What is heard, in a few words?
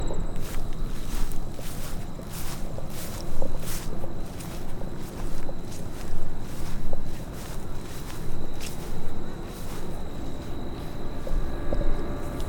Walking